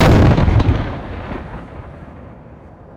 loud field cannon firing outdoors; guns

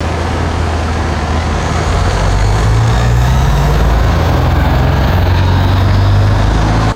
heavy bus accelerating and driving away; vehicles

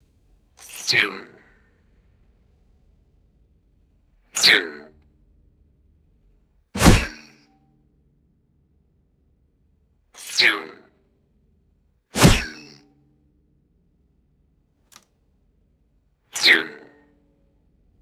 bowstring release twang and arrow impact; weapons

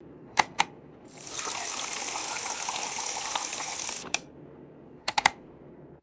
drinking fountain turning on and off

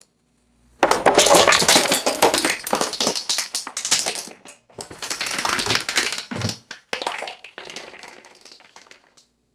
full plastic bottles knocked over and rolling

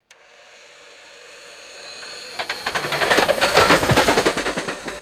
clockwork model train derailing and crashing; mechanical

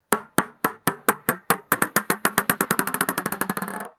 ping pong ball bouncing to a stop

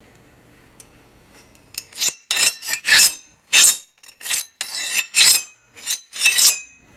fast metallic knife sharpening scrapes

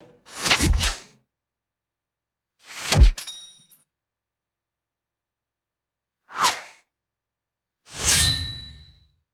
arrow whooshes and metallic impact; weapons

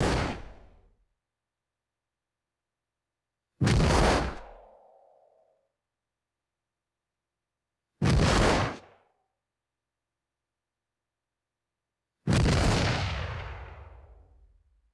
fast gunpowder flash poof and whoosh; guns

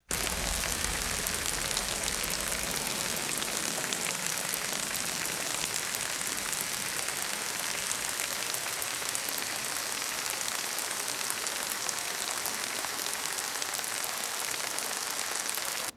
steady rain falling on leaves

